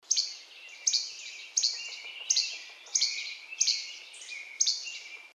FLYCATCHER LEAST; species/birds; wbba

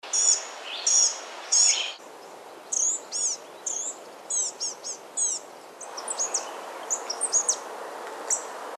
GNATCATCHER BLUE GREY; species/birds; wbba

